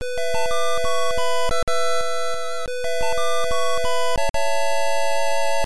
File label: Stage completed; testament msx2 gamerip 1988